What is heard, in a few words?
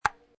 Ping Pong Table Tennis Bat Hit Ball; Sports; Lynne Music